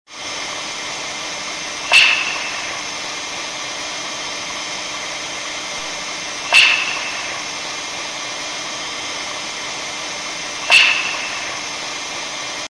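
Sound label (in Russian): звук северного сияния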